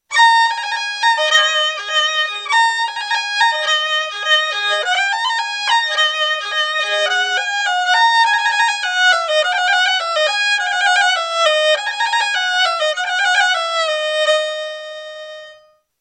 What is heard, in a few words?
kamanche; instruments